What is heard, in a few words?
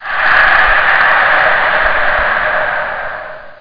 crowd